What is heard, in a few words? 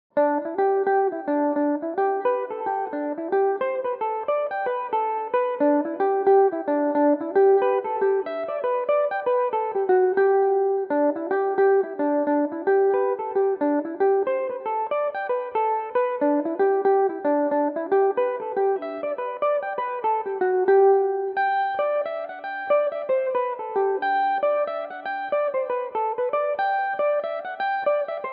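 G major, Irish mandolin; maggies leap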